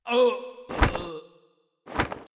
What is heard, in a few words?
Mob die; Bossmob; 욱일승천류; 야쿠모